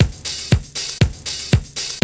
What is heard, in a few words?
DISCO 119, loop, 115-121 BPM